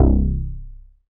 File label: BASS STER.